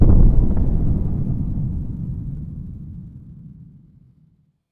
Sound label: thunder; orbit